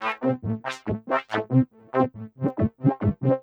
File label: TECHNO EXTRA 140